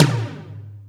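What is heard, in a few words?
Md Flange, Alesis D4 drum machine tom; 300 Drum Machines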